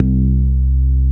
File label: Basses C; Vengeance Samples